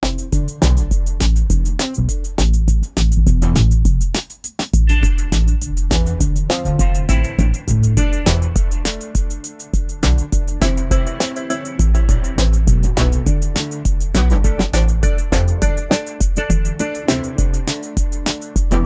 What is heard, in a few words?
upbeat pop track with cle